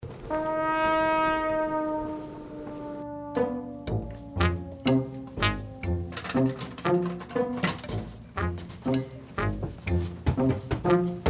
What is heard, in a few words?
frying pan